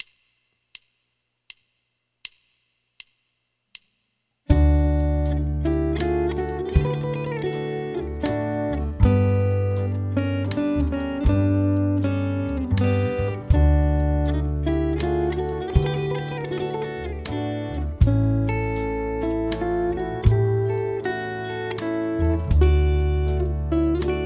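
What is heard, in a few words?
Country Solo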